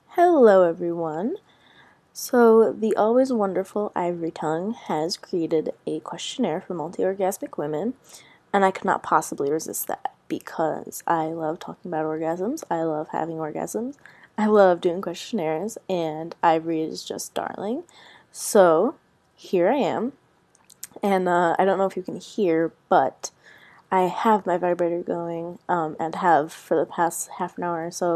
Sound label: multiple orgasms, lady noise; surprise; giggles; blabbering